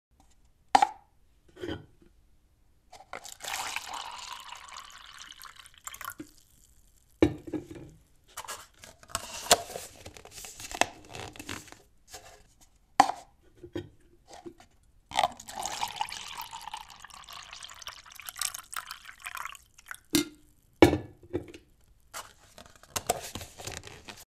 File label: paper cup